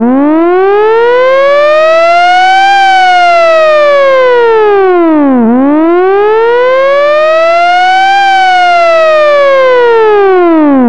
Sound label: vintage wail siren